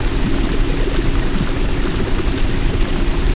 water; lucius

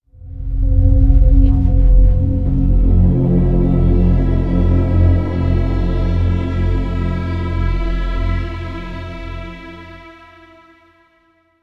Level Start Intro; Operation Blood Storm; Mars Crash

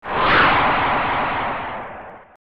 Fighter Jet; The Simpsons Bart's Nightmare; SNES

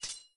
Attack Dagger

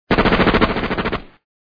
Machinegun; Pico

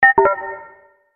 Confirmation Tone

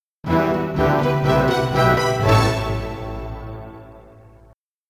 Computer Award

